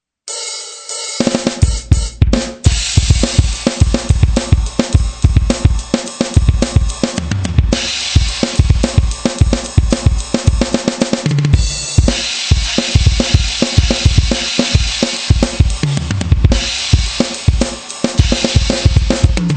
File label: bd sound